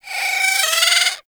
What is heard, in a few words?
Avichaea Vocal Idle; Hunting Critters